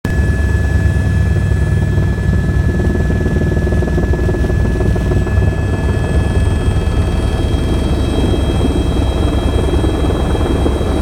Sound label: inside helicopter